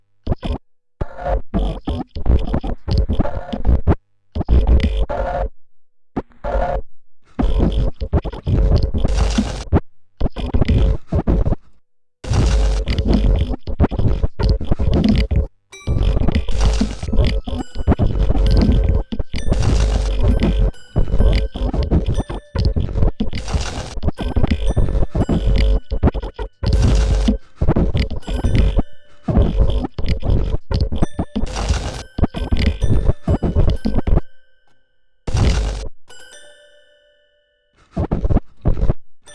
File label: DJ Mix